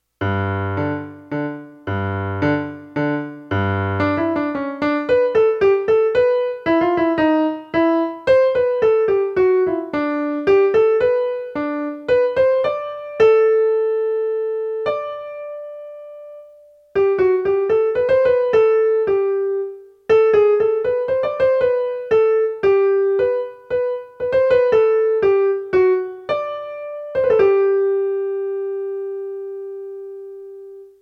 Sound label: descant